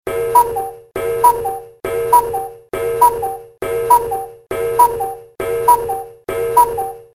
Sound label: Gong Cuckoo